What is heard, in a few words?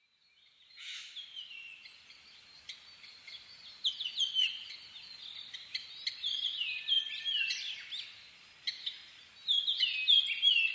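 Japanese grosbeak; ikaru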